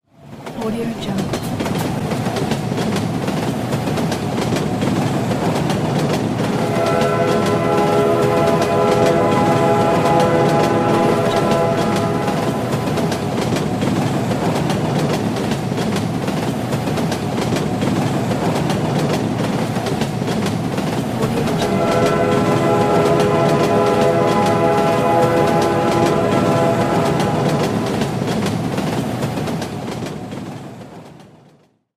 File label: train sound